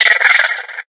box monster chitter

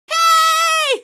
cheer, female; hl2; coast; odessa; Source engine

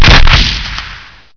turret fire; Source Engine; bshift

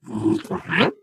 ballbot confusion

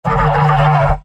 pyro battle cry; mvm

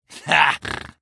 laugh short; medic; source engine; tf

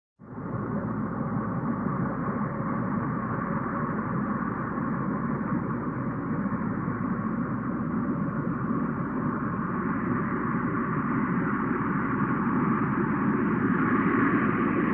airplane, jet large passenger jet take off; cartoon

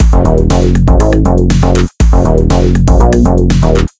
dance loop, halloween; video games; hwn; source engine; tf

